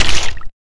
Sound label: gib